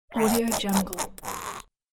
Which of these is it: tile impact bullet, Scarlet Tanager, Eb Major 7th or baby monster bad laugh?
baby monster bad laugh